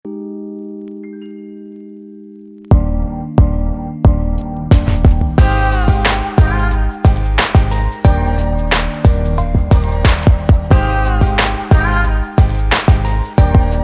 Urban

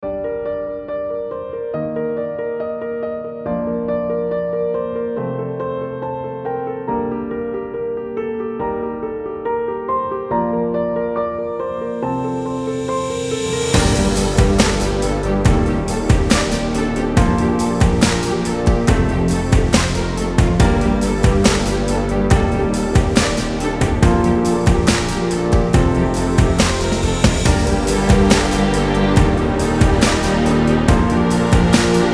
Inspiring Piano

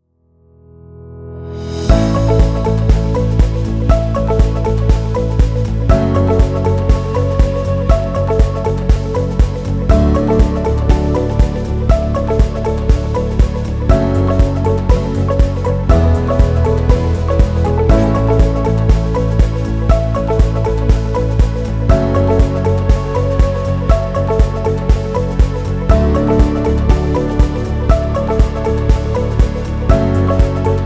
Inspirational

